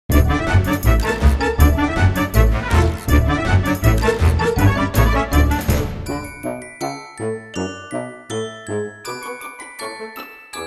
menu music

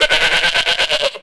goat; animal; Tony Hawk's Project 8; XBOX

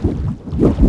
body flesh engine; Quantum Redshift; XBOX; kutoo

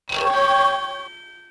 CRYSTAL; Crash Bandicoot The Wrath Of Cortex; XBOX

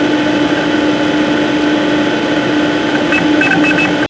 ambience computer room; ambient

